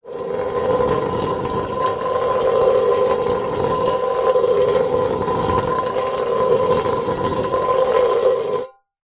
foley tin metal cylinder lid on scrape on concrete long; zapsplat